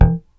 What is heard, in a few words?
bass 3 (thump); Sonic Foundry (Sony Creative Software); Ugly Remnants VOL-2; Basstuff